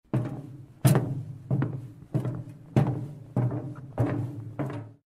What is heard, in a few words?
Walking on metal